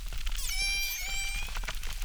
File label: Chirp zap, acquarium